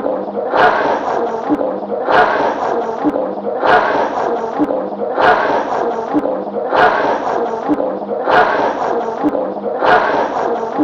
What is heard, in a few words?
LAUGHING SHAKERS LOOPED